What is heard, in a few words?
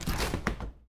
door open; Golden Crayon Sounds